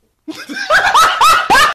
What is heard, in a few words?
Laughing